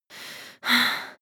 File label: breath female active aroused